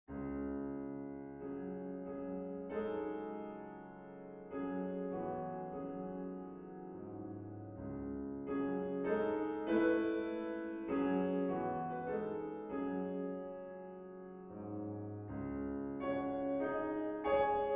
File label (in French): harmonie quinte